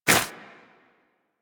Armadillo Dig Snow; Warframe; Hunting Critters; Foley

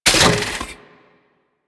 Roller Deploy; Enemies; Warframe; Kela De Thaym

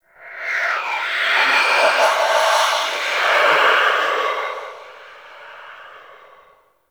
Attack Vocal; Enemies; Stalker Acolyte

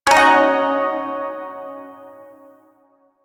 Shawzin Yo Chord L, Music; Chords

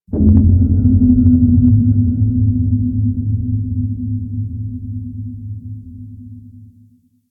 Moon Texture Large, Ambience; Warframe; Orokin

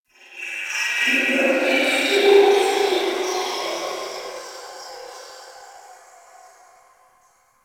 Ice Distant Robotics; Ambience; Warframe; Corpus Ice Planet

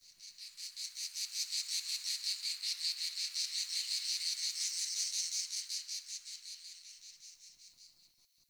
Insect Day; Warframe; Ambience; Eidolon Plains; Eidolon Landscape; One Offs; Insects

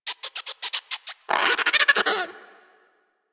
Forest Rodent Vocal Captured Idle; Warframe; Hunting Critters

